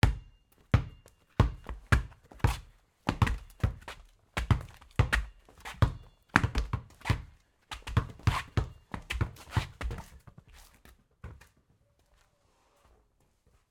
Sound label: Basketball street game - Multiple middle paced dribbles on cement, shoe scraps; Sound Ex Machina; BASKETBALL GAME ULTRA